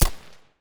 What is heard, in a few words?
hk416 silencer; STALZONE